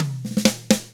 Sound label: surf rock, Drums; ILIO; Double Platinum Drums 1